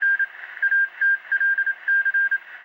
peace summary message; Hearts of Iron IV Death or Dishonor

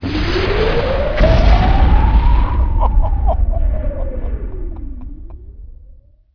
death hand launch; Emperor - Battle for Dune

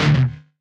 crunch; Frets on Fire

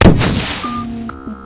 irifle fire, weapons; Garry's Mod; hl2

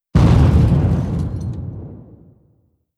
airplane bomb; Hearts of Iron IV Death or Dishonor

